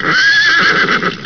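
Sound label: horse neigh; ANIMALS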